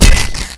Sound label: cat hit